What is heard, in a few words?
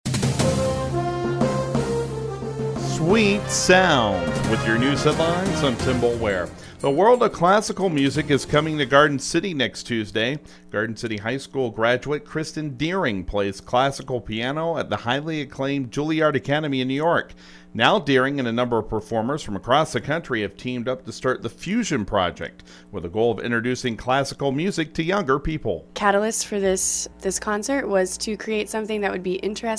classical concert